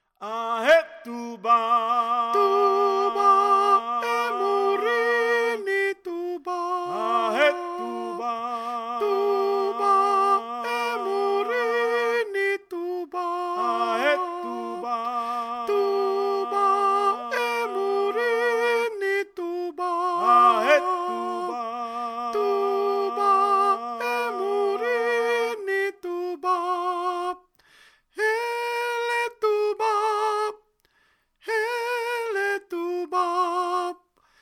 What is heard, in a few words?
soprano